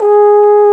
BRS BONE, Solo T.Bone; Roland L-CD702

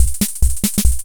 Loop, Hammond Auto-Vari 64; 300 Drum Machines; Processed

